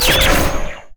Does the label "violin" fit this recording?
No